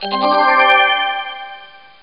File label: Complete; Titeuf Ze Gag Machine; gba gamerip 2002